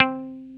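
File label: guitar, Dan Electro, 6 string; Dan-O 6 Str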